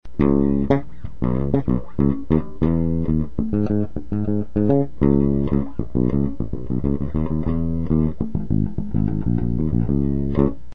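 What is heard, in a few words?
fender mid, bass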